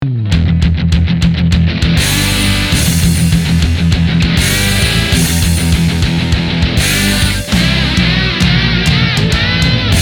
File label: rock guitar